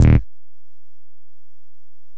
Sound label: Sound, Percs